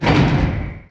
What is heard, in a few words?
slam